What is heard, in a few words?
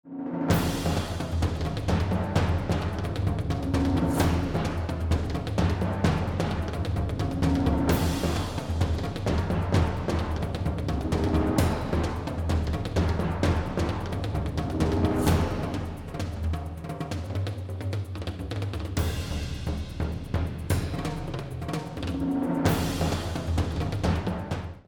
BGM Battle Stage